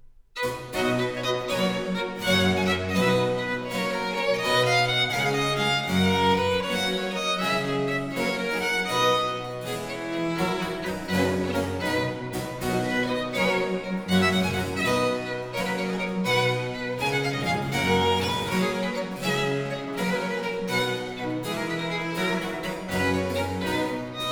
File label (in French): Pièces de violes, Suite in C Minor; Book 3, No. 23-32; Nicola Matteis; Ayres for the Violin; Quatrième Livre (Paris 1717)